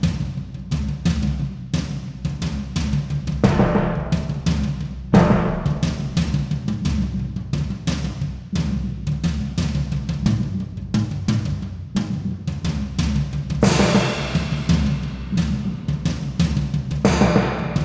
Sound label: Music Battle Perc; MushroomBoss